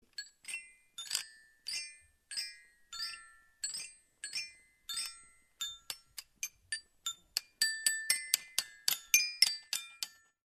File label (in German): glockenspiel